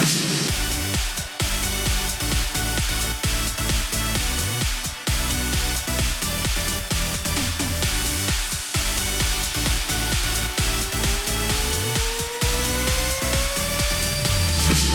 Music Dance Party; barbie dreamhouse adventures

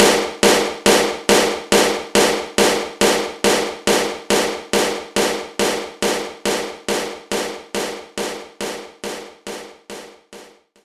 Power Snare

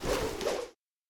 Roll Dodge; Lola

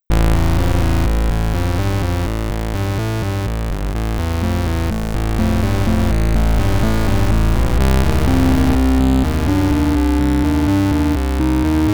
Intro, Chiptune

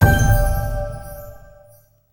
item find; escape from frankensteins tower

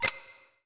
Button Select; Rabbids Big Bang; Android iOS Windows gamerip 2013

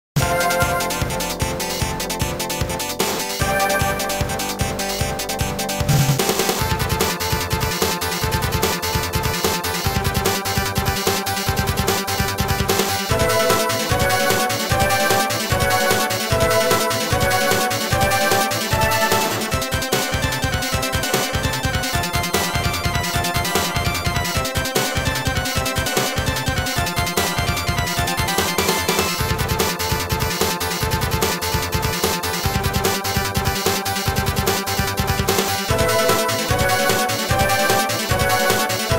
Maximum Speed 第6ステージ; Vulcan Venture; arcade

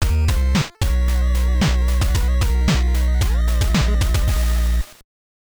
Logo (NES Version); Taito; Target - Renegade - NES Version

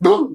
hit; Hollow Knight

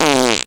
Fart

